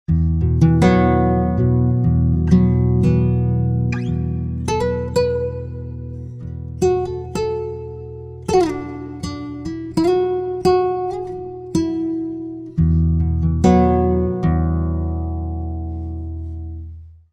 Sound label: guitar, pitchshift -5; bias peak